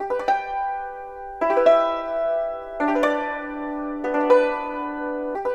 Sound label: YNCHIN 100 G; INIS - Opium; YNCHIN 90-G